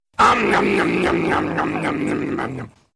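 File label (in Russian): ом ном ном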